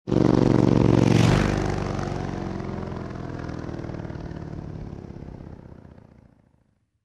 Motorcycle passes by

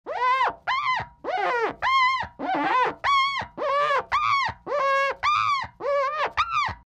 Glass friction creak

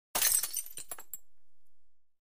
glass breaking

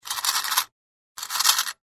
Removing coins from the cash register, money

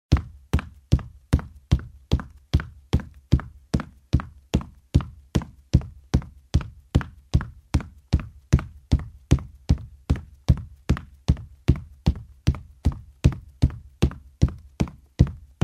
Running on a wooden floor in shoes; steps

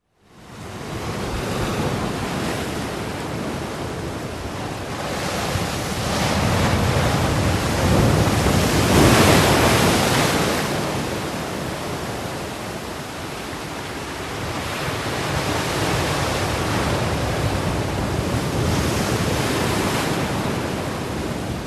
Waves crash on rocks; sea

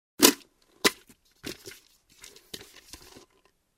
Motorcycle helmet in hands

